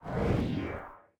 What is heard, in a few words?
swish; smite; god thanatos